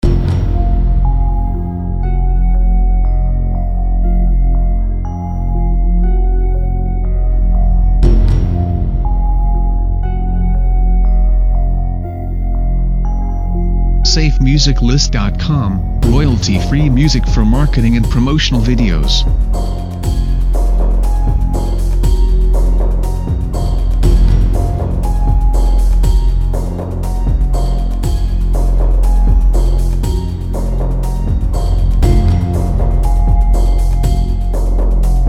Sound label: Dark Mystical Adventure Loops; SafeMusicList